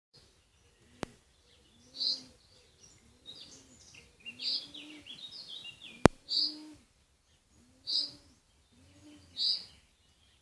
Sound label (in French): Ecoute les oiseaux devant ma porte